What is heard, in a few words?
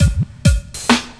Urban Beat, Drums